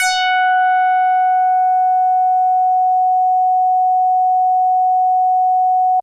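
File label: Synthe Bass DX100 F#2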